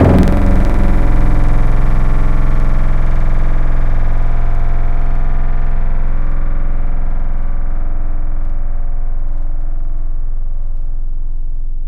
Caribbean, Tropical Mallets; Synthetic's Serum Collection; SparkPackers

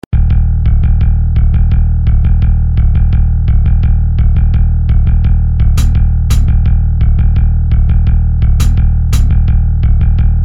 Noise Fountain

